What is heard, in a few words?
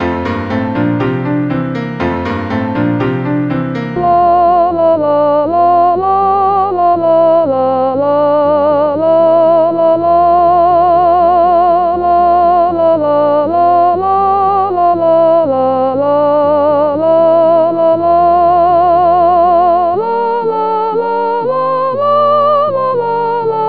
Soprano; Ar hyd y nos